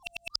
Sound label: Fish loose